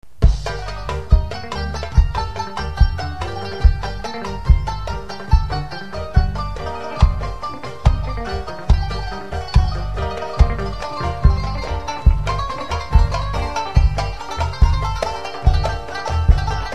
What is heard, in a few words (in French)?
qanoun, tabla, guitare